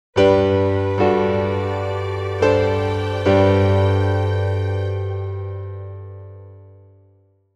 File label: bright ending piano stinger